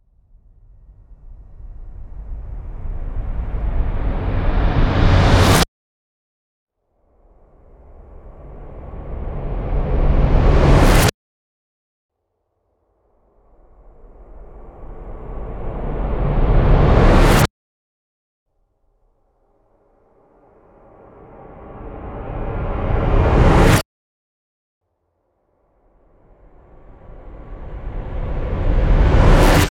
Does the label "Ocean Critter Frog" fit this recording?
No